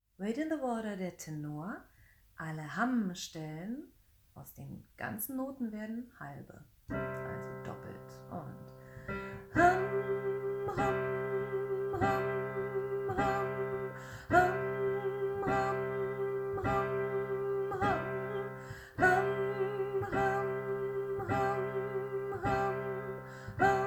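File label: Wade in the water tenor, gospel choir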